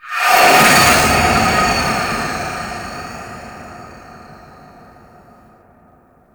Void Tower Capture Successful

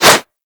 Whoosh, Weapons; Warframe; Furax

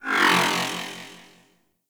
Magnetize Deactivate; Warframe

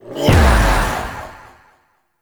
execute heavy strike; Warframe; PaxDuviricus

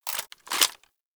Gun Move Foley; Frumentarius; Warframe; Ambience; Nineteen Ninety Nine

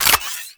Modular Secondary Handle Fast Reload, Guns; Warframe; SU Modular Guns; Reloads

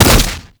Arcade Automata Impact; Gameplay; Warframe; Nineteeen Ninety Nine; Deep Archimedia

